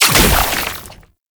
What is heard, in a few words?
Entangle Slow Down; Warframe; Oraxia